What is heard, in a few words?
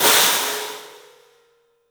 CYFX, EFFECT CYMB; 3D Sound; 3D Drums